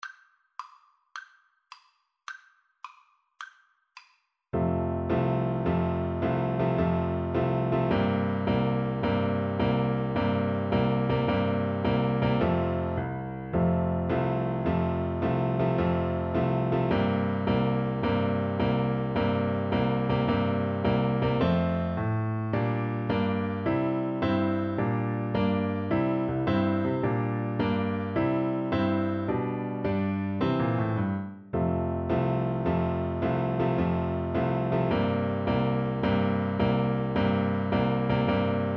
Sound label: Piano Duet